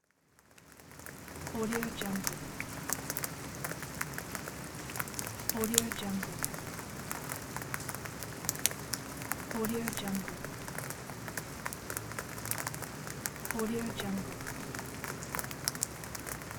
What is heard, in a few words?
under rain